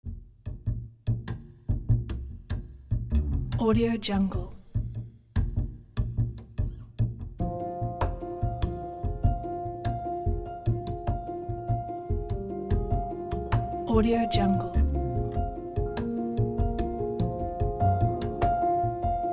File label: mysticism sacrament